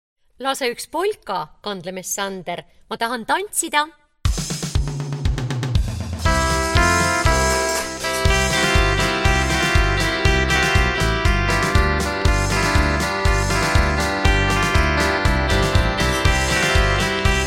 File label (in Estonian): Tootsi polka Imeliku polka